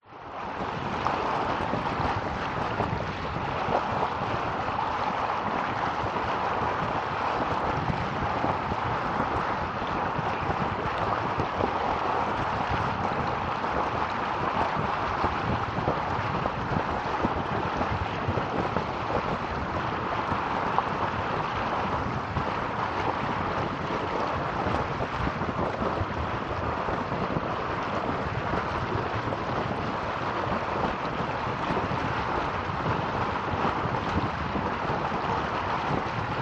nature underwater recording stream or waterfall flowing water hydrophone